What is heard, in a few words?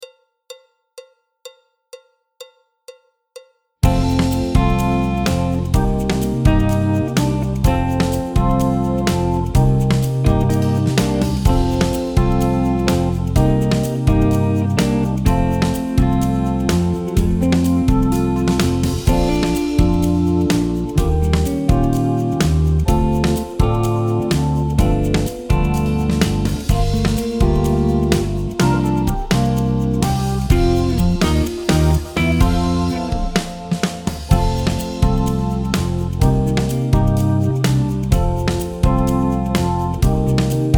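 backing track, Jazz; alto, trumpet, trombone, piano; Jazz Syllabus Level 1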